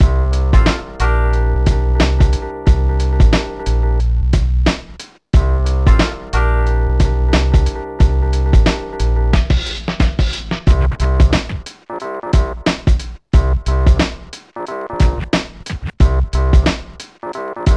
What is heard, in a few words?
Bpm90 Loo L; Gassen